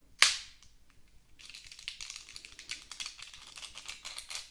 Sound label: cookie sound